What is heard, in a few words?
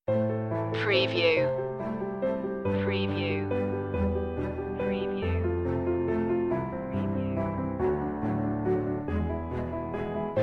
on to glory no orchestral perc